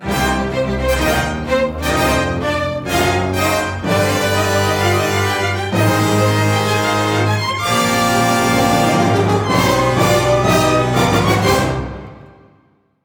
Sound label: Minor Win Fanfare; Regalia of Men and Monarchs